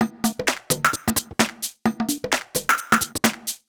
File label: Drum Loop 130, Downtown House; Drum Loops 130bpm